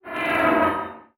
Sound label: Transition Whoosh Fly By